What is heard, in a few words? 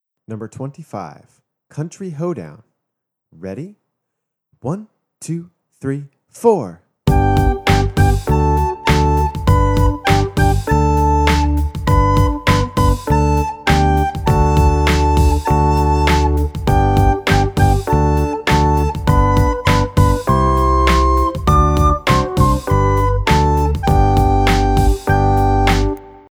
Country Hoe Down